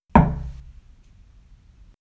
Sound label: knock